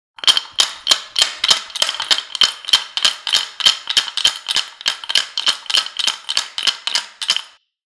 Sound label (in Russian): zvuk trjaski